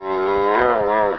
troop pain; svencoop; rngremake; npc; trooper

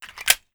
Mag In; svencoop; 1911meu

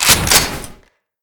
shotgun pump; svencoop; rngremake

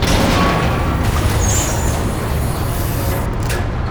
platform open; svencoop; rngremake

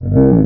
pain; svencoop; necroscasm

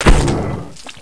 bite, bullchicken; svencoop

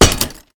smg gren bounce; svencoop; rngremake

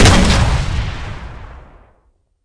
smg gren fire, weapons; svencoop; rngremake

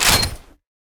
shotgun draw; svencoop; rngremake

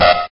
warn; svencoop; angel

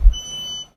bip; svencoop

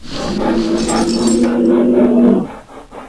barnacle alert; svencoop

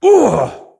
horseman wound; svencoop; keenhalloween; horsemen

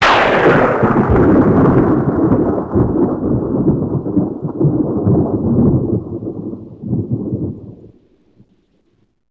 thunder heavy, thunderstorm; l4d2

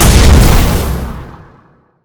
smg gren explode, weapons; svencoop; rngremake

